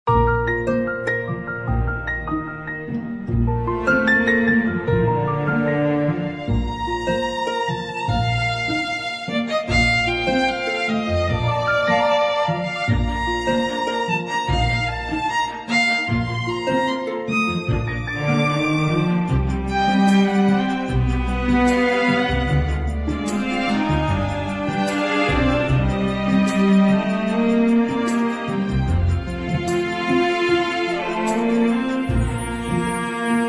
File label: bgmusic; mine clearance; 扫雷